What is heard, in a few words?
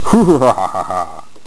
Hoohaha; Fracas 1.5.2